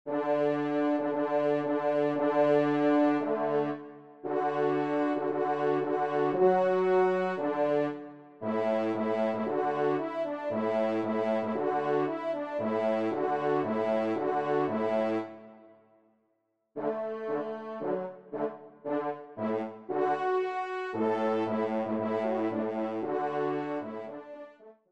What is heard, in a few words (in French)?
Quatuor; 4e Trompe; Dauverne; Quatuor N1